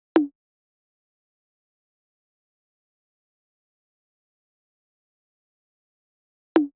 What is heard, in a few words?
Perc, Cm, 150bpm; SAVAGE MOOD vol 2